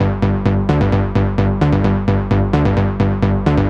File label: NRG Bass, Trance